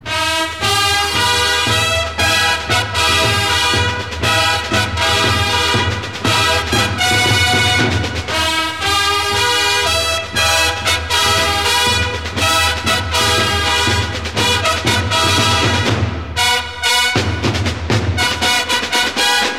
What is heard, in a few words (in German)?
Rhythmus